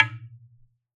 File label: Wooden Bar Hard Strike